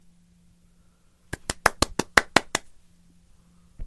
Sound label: little clap, clapping